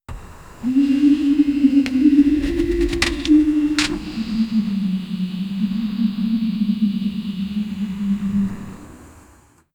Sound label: distant sinister laughter